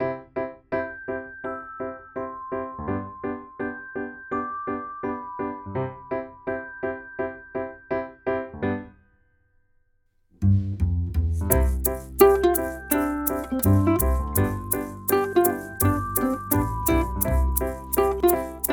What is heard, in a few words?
Short Quirky Opening